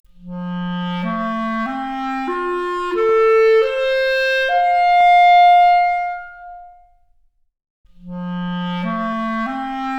Clarinet arp, Stereo output noised; 3bit

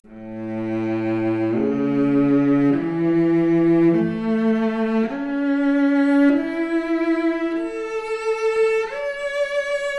Violoncello arp, Stereo output noised; 4bit

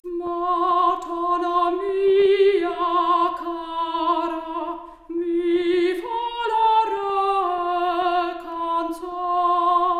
Soprano Stereo output noised; 3bit

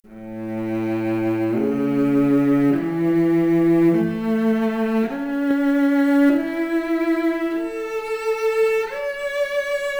Violoncello arp, mp Stereo output noised, 3bit

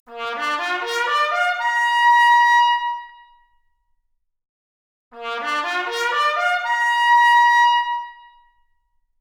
Trumpet arp, Stereo output noised; 3bit

